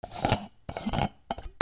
KITCHEN

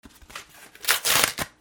RIPPING

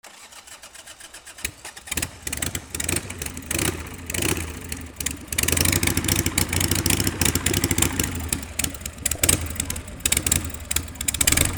VEHICLES

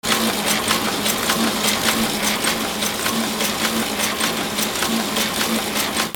INDUSTRY

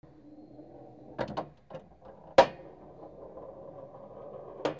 BILLIARD

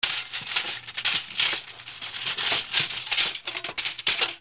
BREAKING

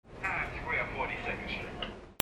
AMBIENCE

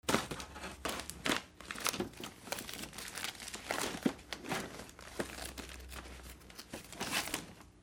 HOUSE

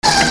CARTOON

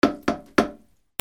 BATHROOM

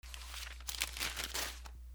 PAPER

